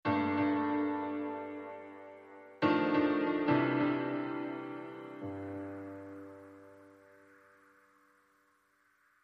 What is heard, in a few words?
Dubstep, Sting